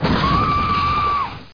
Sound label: DEATH; That - For Windows 95; YAAC